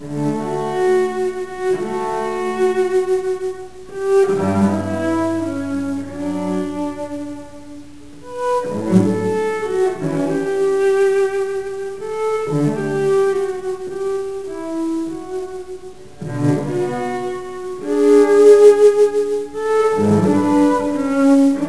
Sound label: Sarabande, cello; Bach Suite 6